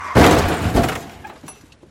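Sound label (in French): Collision arriere, Accidents voitures; Accidents voitrues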